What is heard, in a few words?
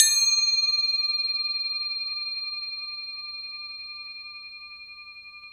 Crotales, Orchestral Perc